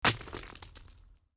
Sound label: rock small ballast fall on dirt; matter mayhem; soundmorph